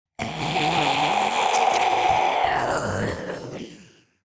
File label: Demon beast imp monster groan death